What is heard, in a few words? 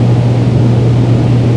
fan mach, amb machine; SiN; environment